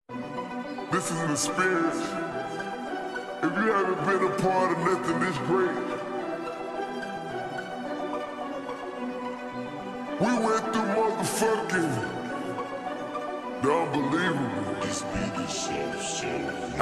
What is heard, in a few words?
slowed reverb